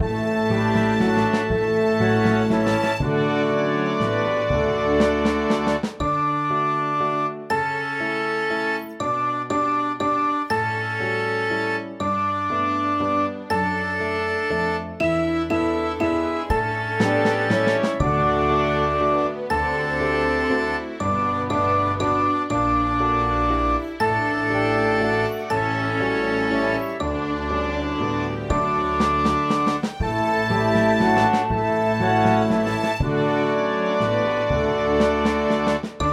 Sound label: Katies Waltz orkest met solo